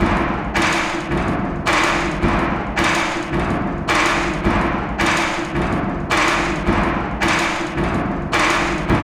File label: LOOP, Tekno Industrial; East West